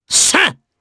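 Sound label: Vox Attack Japanese; kingsraid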